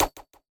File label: stab no hit; HeavenStudio; fork Lifter